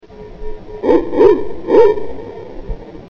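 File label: Western Tanager slow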